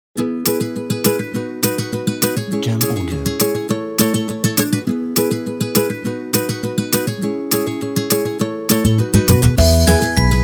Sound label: Happy Music Background